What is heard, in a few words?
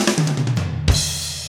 Fills, Roland; Sound & Vision - Gigapack I; Perc